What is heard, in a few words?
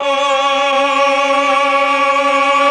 CHOR, Propeller Island Legendary M400